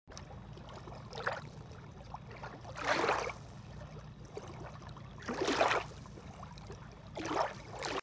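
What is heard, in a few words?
soft wave splash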